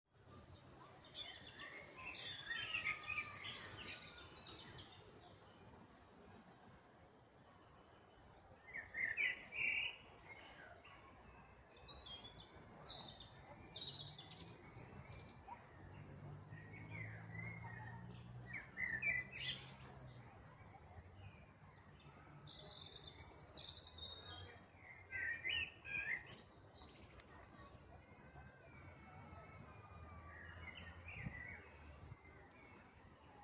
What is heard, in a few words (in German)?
Amsel Rotkehlchen; Stunde der Gartenvoegel